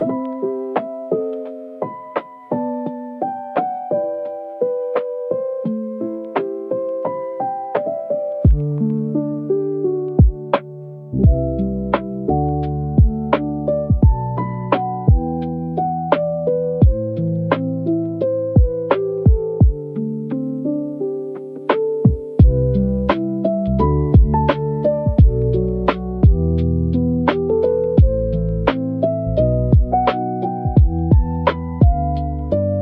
Rain on Old Keys, lofi piano for recall and nostalgia